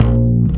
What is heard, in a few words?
Upright Bass Notes